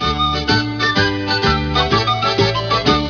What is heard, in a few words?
JIG